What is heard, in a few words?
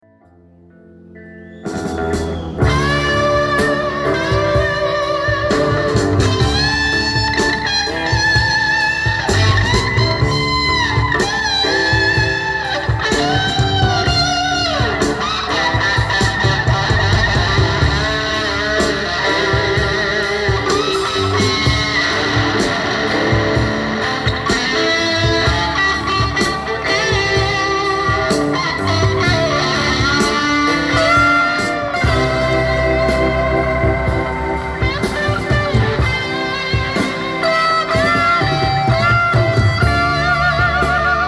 Solos Using Power Boost; Oakland